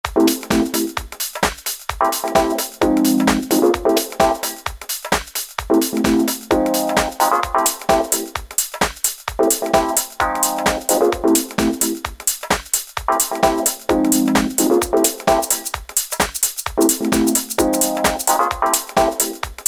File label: lounge house